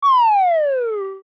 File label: falling whistle weeeee